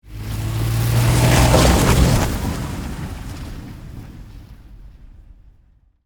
Saturn Vue, Automobile, Pass, By, Slow, Snow, Grass; Transportation; BlastWave